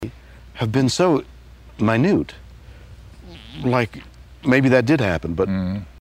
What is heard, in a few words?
fart